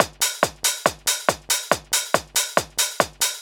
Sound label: Addon Loop, Drum Loops 140bpm, Perc Loops